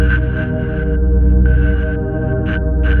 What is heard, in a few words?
noise; ゆめ2っき